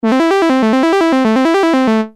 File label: mono; 打鍵毎にエンベロープジェネレータが働く; MONOは、モノフォニックで、どんな弾き方をしても打鍵毎にエンベロープジェネレータが働く; nord